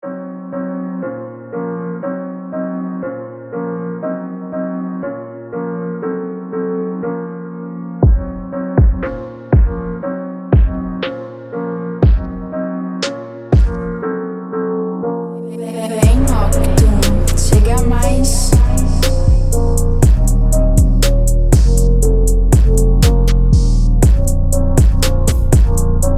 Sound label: Bells Hell 120BPM